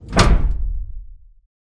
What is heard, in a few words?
metal door hatch close slam; SFX; Environment; Doors; ProSoundCollection v1 3